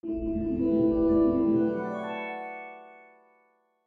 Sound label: dm chord; matching game